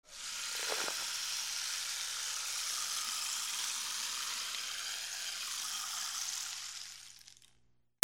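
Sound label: percussion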